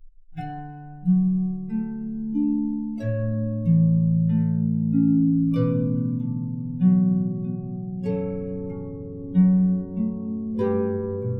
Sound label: canon big harp